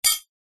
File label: cutlery tea spoons x2 drop into glass jar; household